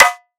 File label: drum rim metallic; Virtual Riot; Drum Hits